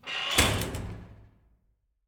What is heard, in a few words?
close creaky, doors, jail